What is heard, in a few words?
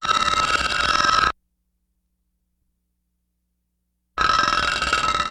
Loops open; tony schwartz